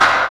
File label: PRC NOATK, Clap; Rhythm Section; Clap & Snap; Clap Modules